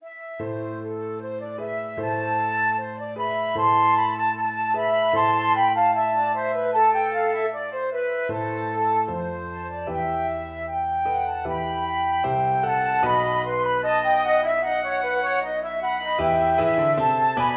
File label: but ere we this flute duet